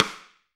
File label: RIM MIX, AMBIENCE RIM